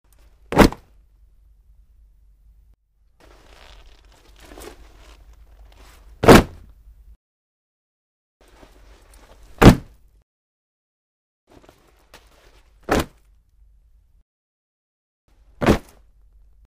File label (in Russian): brosayut obuv na pol